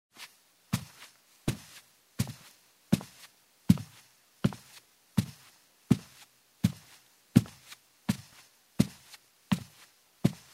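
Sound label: barefoot steps on carpeted wooden stairs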